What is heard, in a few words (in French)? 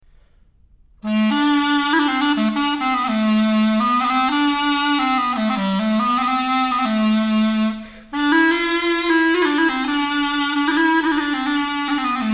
chalumeau Improvisation IV